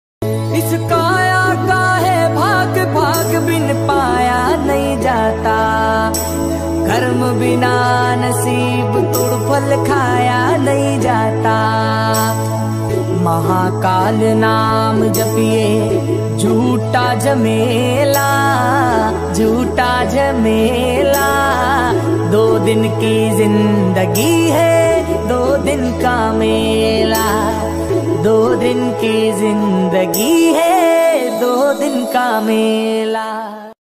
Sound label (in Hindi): Mahadev ringtone bhakti Bahajan Ringtone